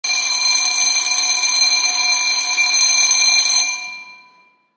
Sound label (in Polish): dzwonek